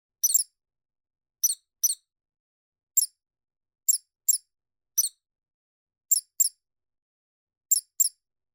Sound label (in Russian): Zemleroika